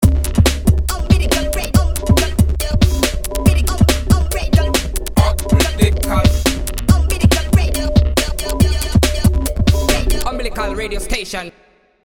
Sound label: jingle